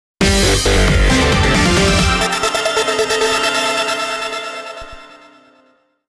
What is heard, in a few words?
Winning Tone; Space Invaders Invincible Soundtrack Volume I-II